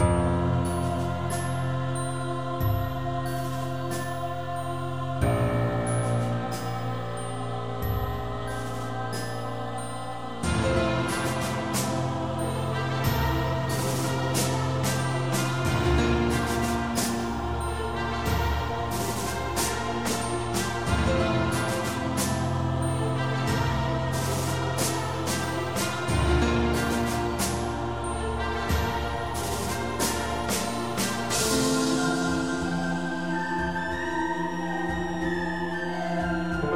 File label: misc castle